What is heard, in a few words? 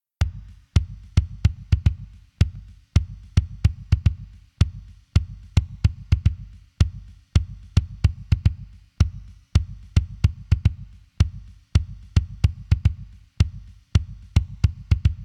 drums